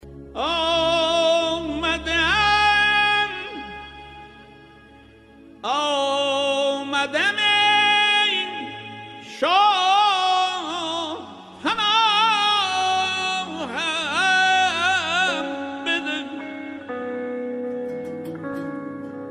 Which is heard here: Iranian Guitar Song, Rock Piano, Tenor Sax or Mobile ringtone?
Mobile ringtone